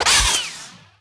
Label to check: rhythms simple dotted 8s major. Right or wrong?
Wrong